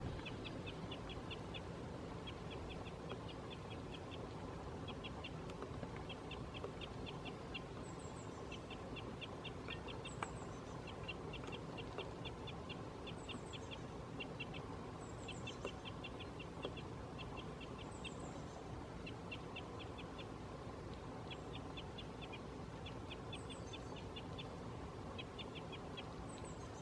Kruisbek Loxia curvirostra; Annelies van Klinken

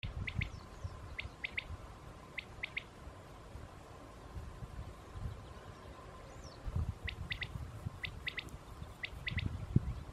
Kwartel Coturnix coturnix

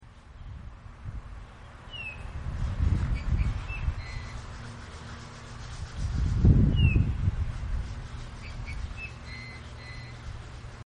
Goudvink Pyrrhula pyrrhula Geluid; Annelies van Klinken

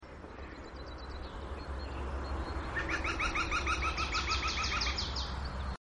Groene Specht Picus viridis Geluid; Annelies van Klinken

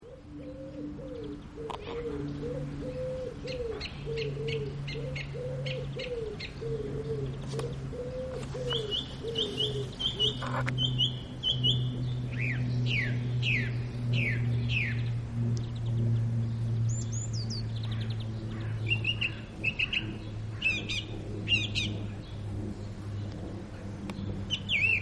Zanglijster Turdus philomelos Geluid; Annelies van Klinken

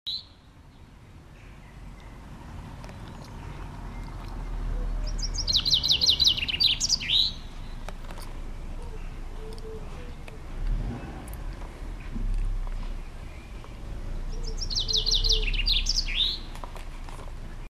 Vink Fringilla coelebs Geluid; Annelies van Klinken

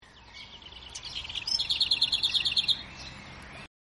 Braamsluiper Curruca curruca Geluid; Annelies van Klinken

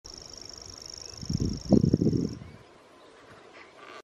Sprinkhaanzanger Locustella naevia Geluid; Annelies van Klinken

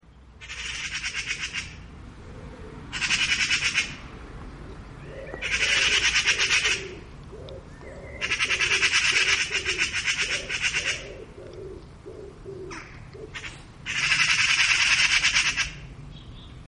Ekster Pica pica Geluid; Annelies van Klinken

